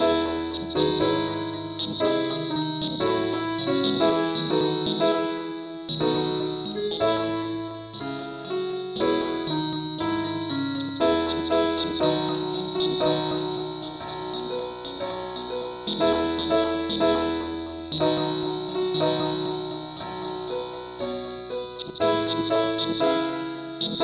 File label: ukelele key e